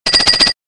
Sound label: incorrect